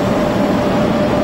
M4000E idle; finnish

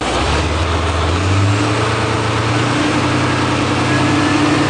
Move90 acc; finnish

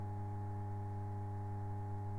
Sr1 idle; finnish

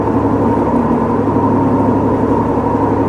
Dm11 idle; finnish

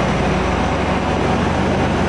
KDh1 run; finnish

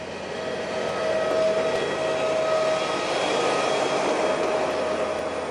Pielstick acc; finnish

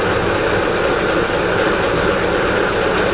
Tve1 idle; finnish

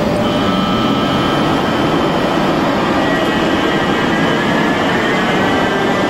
M4000E acc; finnish

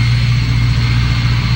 EMD run; finnish

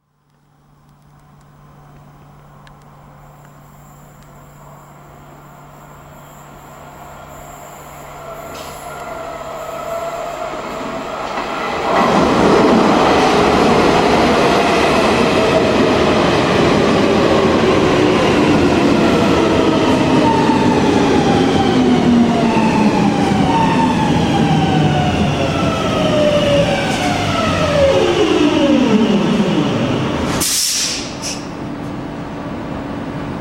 Metro Helsinki, M100; finnish

